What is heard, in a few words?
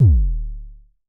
Mild Thump Kicks D1, Vermona Kick Lancet; LookIMadeAThing Kicks; Mild Thump Kick - Precise